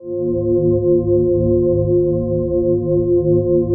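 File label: PAD, atmospheric pads; Best Service; XX Large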